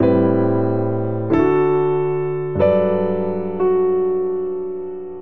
Progression Jazz Block ii - V - I G Major 92 bpm, Grand Piano; MIDI Clips; Ableton; Factory Packs